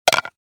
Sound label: Small plastic item drop onto hard surface; impacts; gfxsounds